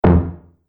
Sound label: Multimedia Button; Business Programs, Apps And Computing Noises